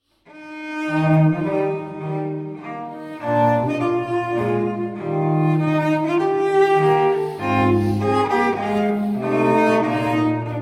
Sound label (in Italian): Sonata in G minor - Allegro (Fuga), Violoncello e basso; Sonata no 5; Vito Paternoster; Pasquale Pericoli - Sei Sonate a Violoncello e basso